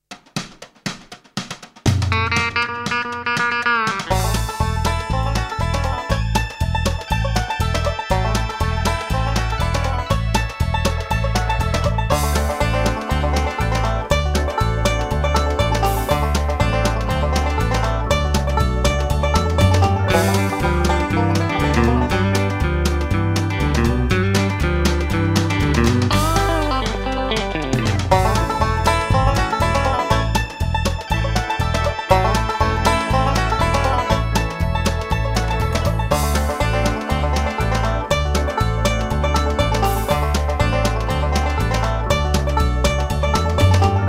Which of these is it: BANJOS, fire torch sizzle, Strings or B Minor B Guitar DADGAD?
BANJOS